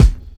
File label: Kick, Kickdrum; Equipped Music; Loungin House